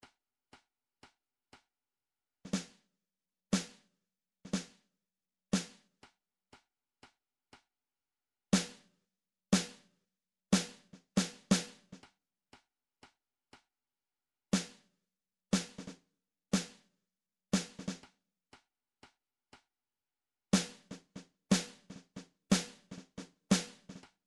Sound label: drum trax snar; rumtrax library